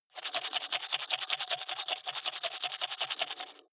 teeth brush dry open inward fast

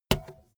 bmx bike tire foot scuff short heavy

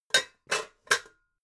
pots put down

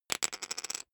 poker chip fall wobble

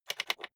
rotary control short turn quick soft

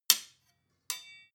sword hit clack release

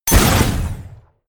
sci-fi plasma cannon fire

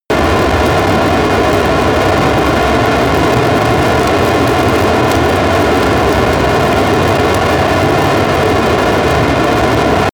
military jet fighter afterburner

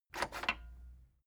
cassette eject click fast

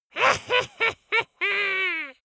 dwarf laugh evil

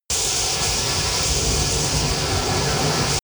spaceship room tone vent noise

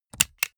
click

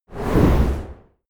push

